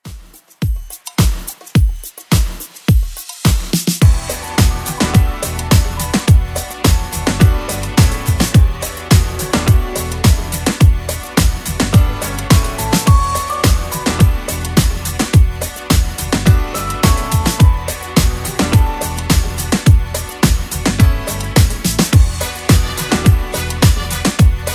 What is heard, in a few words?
80s Redrum 106bpm